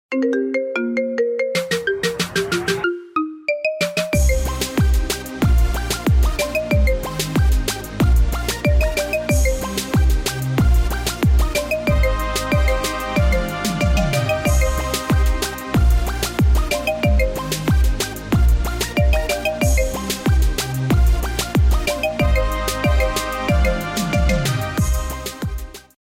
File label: Marimba Remix